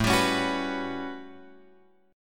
A 13th A, guitar chord; A13 Chord {5 X 5 6 7 7} strummed

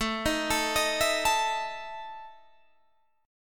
E Major 7th Suspended 4th, A

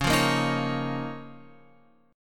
C sharp 6th Add 9th, Guitar, Open F; strummed

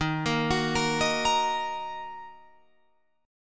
Eb Minor Eb down Guitar Open F; arpeggiated

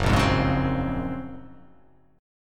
Gb Major Sharp 11th chord; GbM#11; strummed; JGuitar

